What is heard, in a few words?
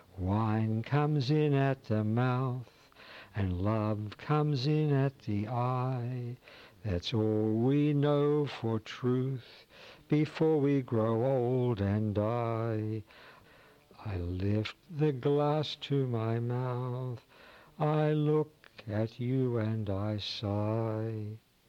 Drinking Song; lilts